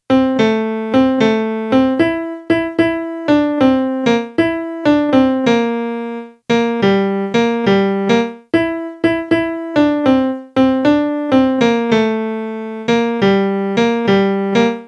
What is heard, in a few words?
melody